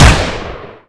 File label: uzi fire, weapons; svencoop